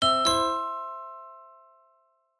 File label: multimedia alert tone piano glock